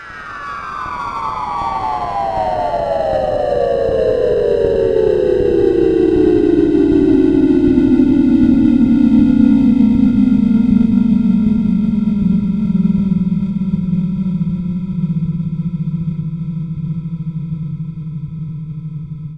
DECELERATION, SPACECRAFT; Zero-G Ambient 1

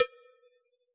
cowbell, Yamaha DJX Rock Kit

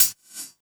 HH, 160 BPM; Ueberschall; Drum & Bass Flash Trackz

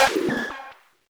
Perc Random; M8; percussion and foley

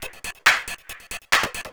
percussion loops, 138, Techno; PS-45 WAV Techno ID

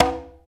Conga Lo; Roland BK-5; Percussion

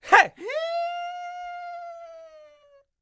YELP, World Voices, ANDES FX

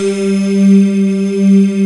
YAMAHA FM; Rubber Chicken Software Sample Bank

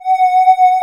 MATRIX 12, OB Synths; E-MU Formula 4000 Series Vol. 3; Analog Odyssey

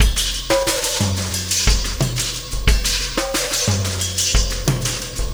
Oscillation Beat

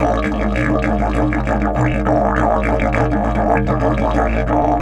DIDGE 100BPM

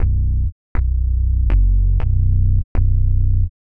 Hummer Bass; Sonic Foundry (Sony Creative Software); The Electronic Music Manuscript Collection; Bass Foundations

